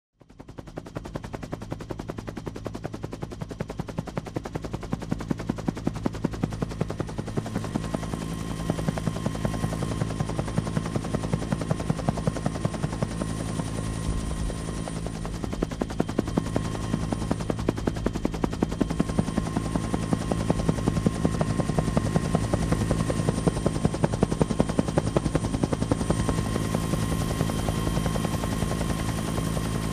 Helicopter in flight

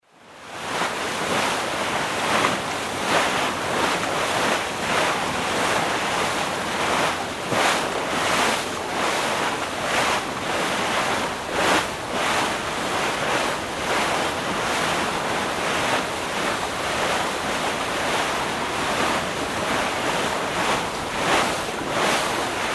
Ship breaks the waves; sea

